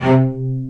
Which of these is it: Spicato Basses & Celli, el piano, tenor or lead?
Spicato Basses & Celli